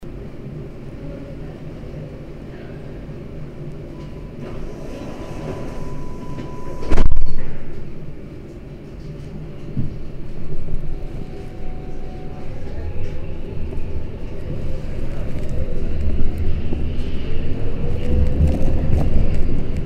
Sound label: subway departure inside